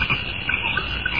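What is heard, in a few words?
crickets noisy mass sharp distant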